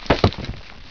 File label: Small Clunker; PC; MARIOSFUNDAMENTALS